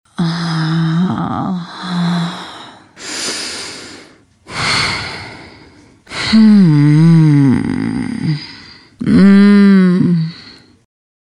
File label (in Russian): Udovolstvie zhenschina